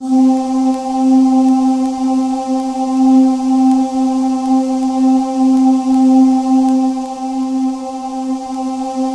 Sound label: C3, Synth Leads